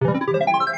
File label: shuffle; slide block